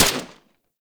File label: Fire, Weapons; Warframe; Tenno; Dual Soma